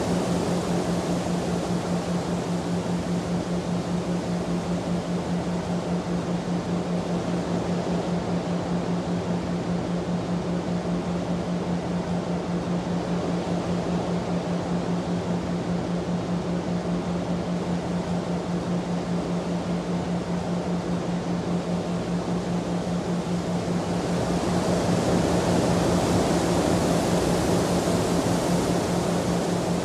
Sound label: Soothing Winds